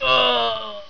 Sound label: cry; PC Games.EXE November 1997; LEDWars